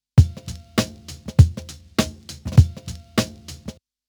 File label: Loop EQed